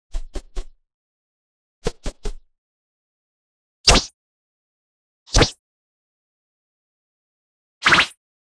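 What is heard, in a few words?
sudden fast cartoon action, slapstick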